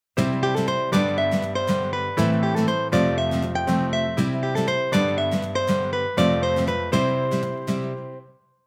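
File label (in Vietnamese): Giai điệu mở đầu vui vẻ ngắn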